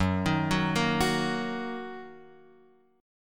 Gb 6th Gb down Guitar Open F; Gb6 arpeggiated; JGuitar

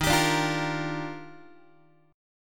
Eb Major 9th, Guitar; EbM9 strummed; x,11,10,12,9,9; JGuitar

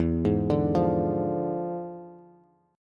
E 7th Flat 9th Bass, chord; E7b9; arpeggiated

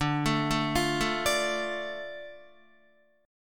D Minor D Guitar; Dm arpeggiated; 9,12,9,10,9,10; JGuitar

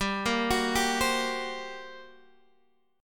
G Minor Major 11th, G, Guitar, Half Step Down

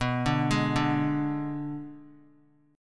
B Minor 6th B, Guitar; Bm6 arpeggiated; x,2,2,1,2,x; JGuitar

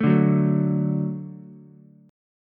F sharp Minor Sharp 5th, Ukulele; strummed; 2,2,2,0

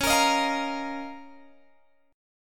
Db 6th Add 9th Db down Guitar Open G

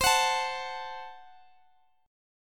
F sharp Diminished C

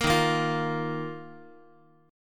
Eb Suspended 2nd, Guitar; Ebsus2 strummed; JGuitar

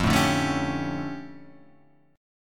D Minor Major 11th, F

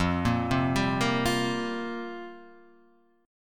F Suspended 2nd Suspended 4th F down

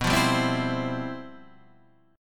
G 13th B, chord

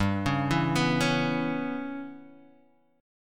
C sharp Minor 7th Flat 5th G

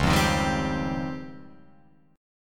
C sharp Major Sharp 11th, Guitar Open F; C#M#11; strummed; tuning=Open F; guitar chord

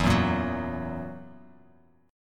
E Suspended 2nd Flat 5th, Bass Standard, chord; strummed; JGuitar

